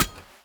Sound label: salvaged axe strike muted; Rust